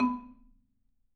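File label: B2, Marimba dead note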